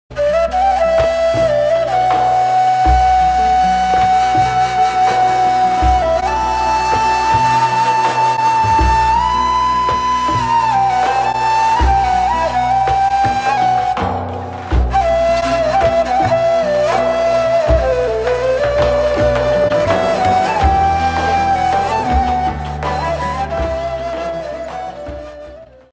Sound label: Turkish flute; فلوت ترکیه ای